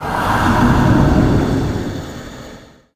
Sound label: ghost; kult